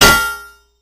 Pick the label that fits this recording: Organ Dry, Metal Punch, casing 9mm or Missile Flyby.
Metal Punch